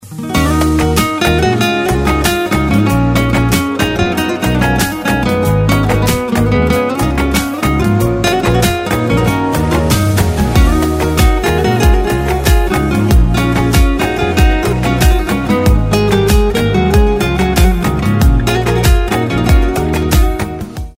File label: Ringtone